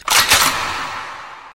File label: Revolver Reload